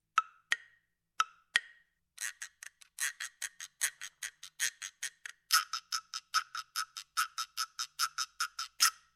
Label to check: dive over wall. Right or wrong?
Wrong